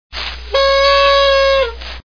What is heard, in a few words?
Party Horn; NewYears